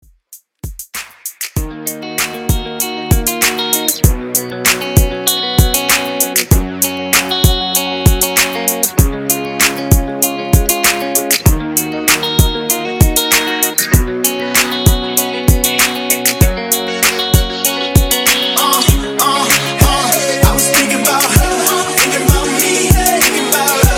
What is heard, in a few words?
Extended In Outro 97 BPM; Redrum